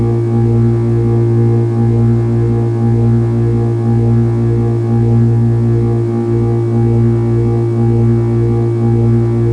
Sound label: hum; sven; caged